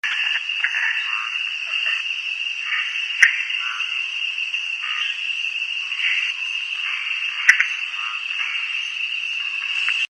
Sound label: Phyllomedusa tomopterna